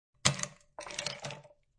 Ice Cb Thrw Stir, Ice cube thrown in glass and stirred.; Ice Cube Throw and Stir 1; Drink Mixing